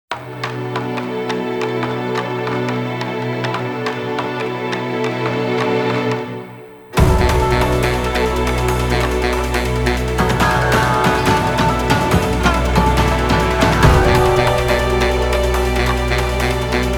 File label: Bamboo Forest Hunting Theme; Monster Hunter Frontier Z; G-Rank